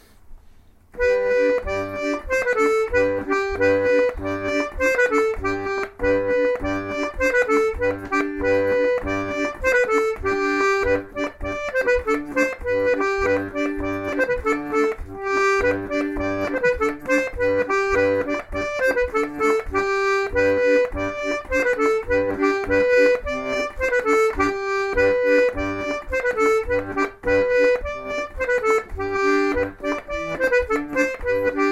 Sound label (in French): polka piquee